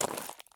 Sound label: jump slate; Escape from Tarkov